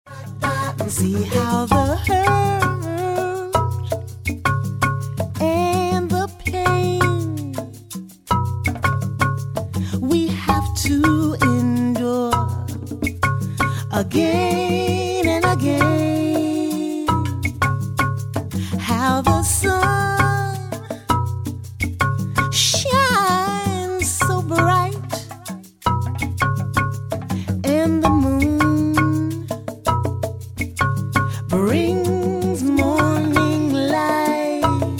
African Chant

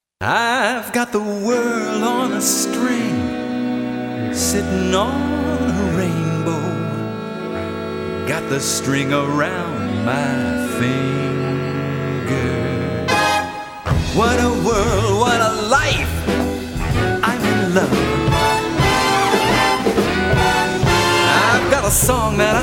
Big Band

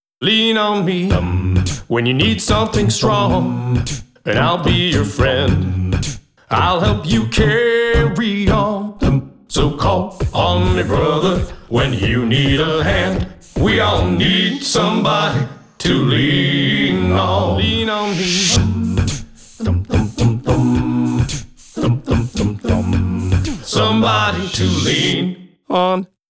ACapella

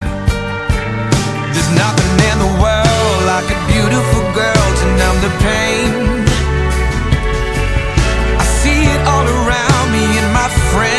Pop

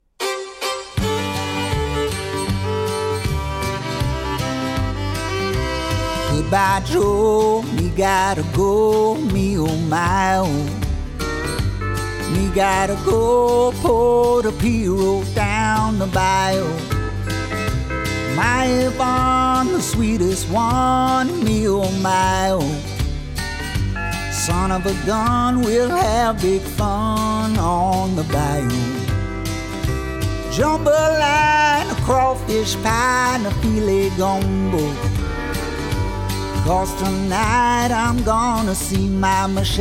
country

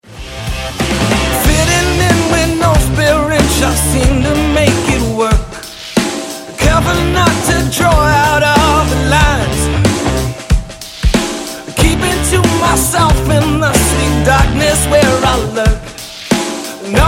Rockpop Sample

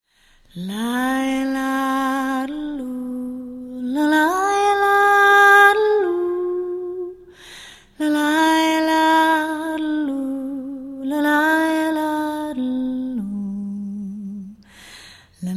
Swedish Lullaby

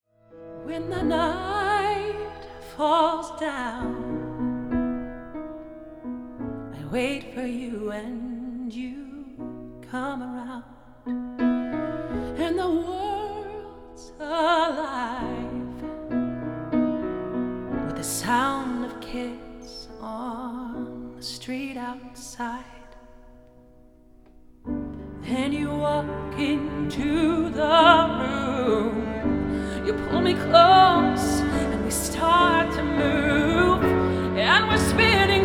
Ballad

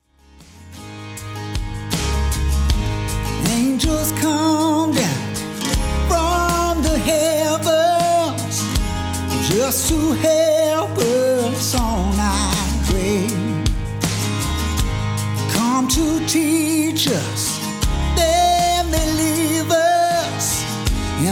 country

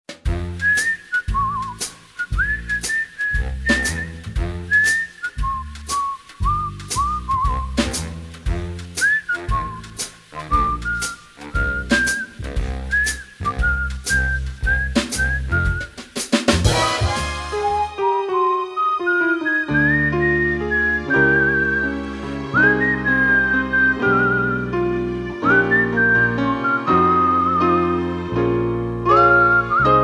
Whistling Reel

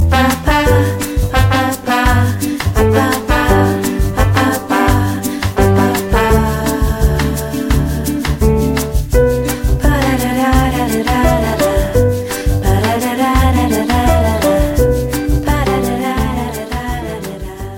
Bossa Nova

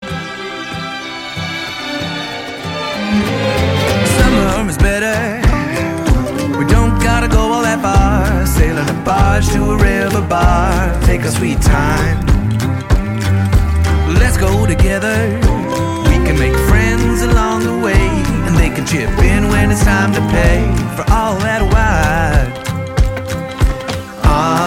Indie Pop, vocalist

